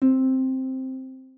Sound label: Harp, notifications; OnePlus 11